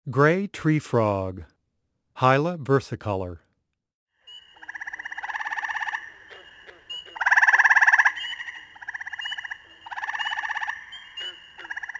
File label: Gray treefrog, frog calls; amphibians; frogs and toads